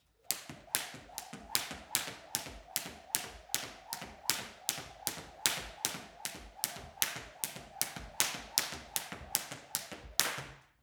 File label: skipping jump fast